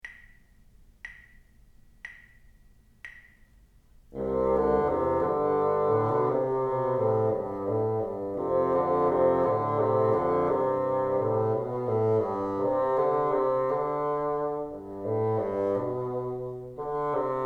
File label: together, bassoon